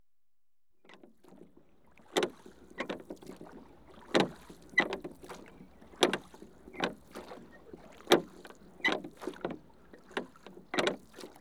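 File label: Rowing Boat 1 MAN Rowing, Constant; Ships And Boats; BBC Sound Effects Library; Ships And Boats 2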